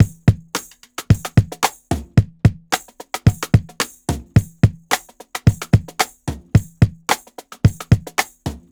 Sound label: 110 drum loop perc cranked; anaheim